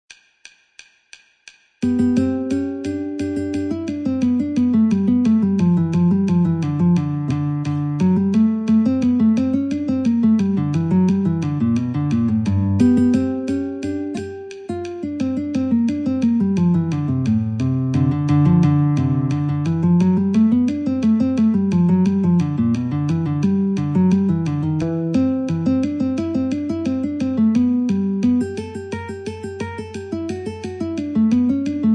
Breakdown Guitar metronome 88

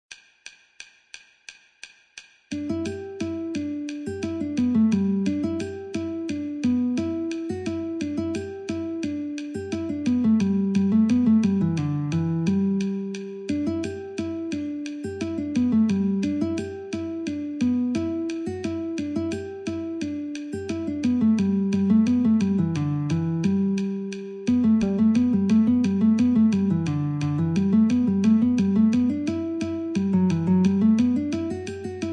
G Guitar met 88

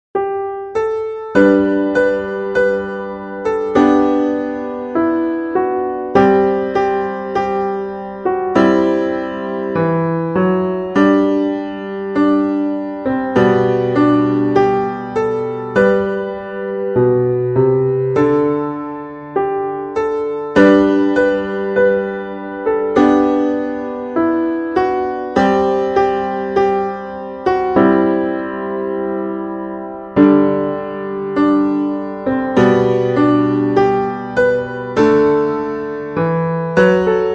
Key of G Melody

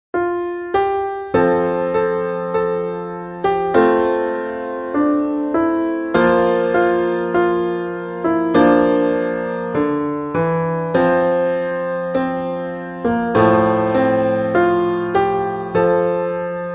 Key of F Melody

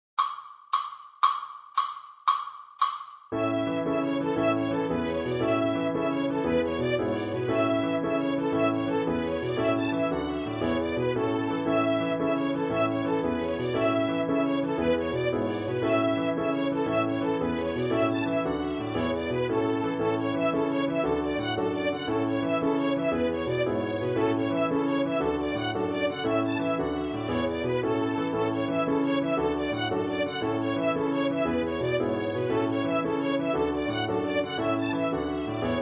Cumberland Jig 116

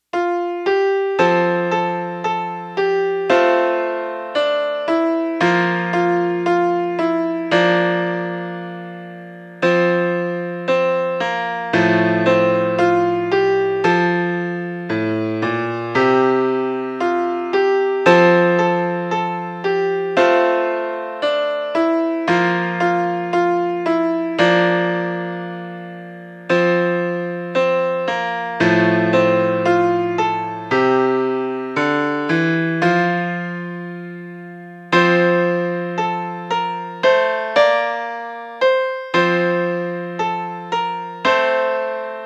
Battle Cry in F melody

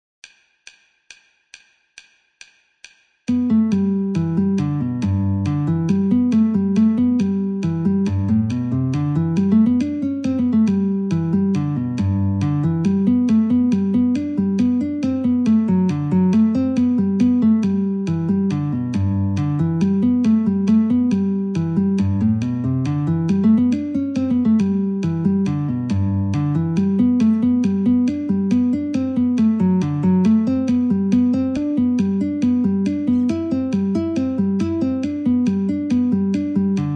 Guitar metronome 69

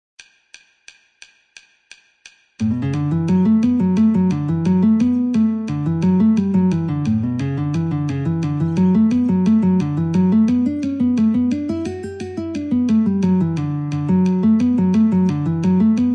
Guitar in D metronome 88

